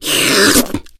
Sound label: smoker reel tongue in, miss